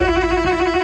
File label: orchestra string hit D